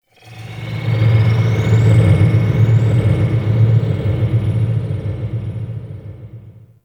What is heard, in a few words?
Dark Telemetry; Ambience; Warframe; Corpus Railjack